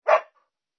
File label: ANIMAL DOG SHEPHERD